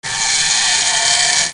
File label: SCIFI